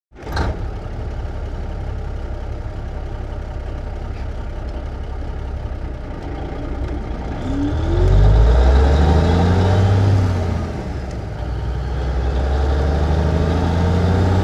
1954 Big Rig Int Start Idle Away Idle Off; Period Vehicles

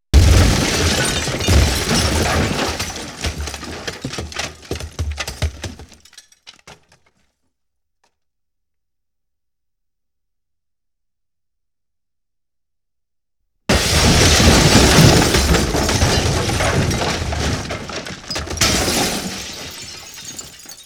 Explosion

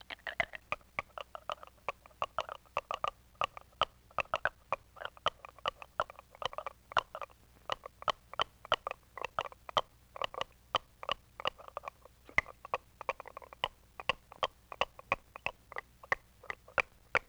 Teeth Clack Steady; Vintage Cartoon; Foley

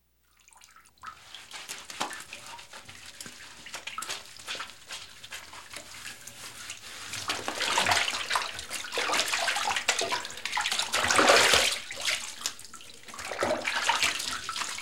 Bathing Washing Scrubbing; Home & Office

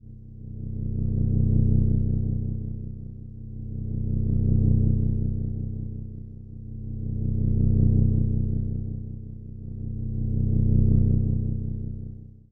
Cyclotronic Throb; Sound Design

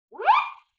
Wind Whistle Zip

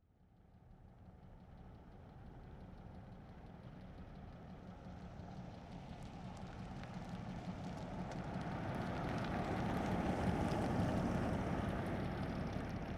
1937 Plymouth Ext Medium By; Period Vehicles

